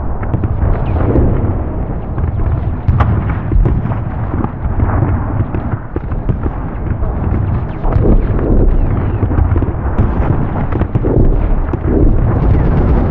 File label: distant battle loop; Quake 4 Alpha